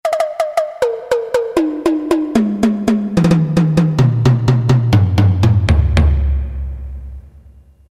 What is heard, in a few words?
Melodic Tom, Roland MT-32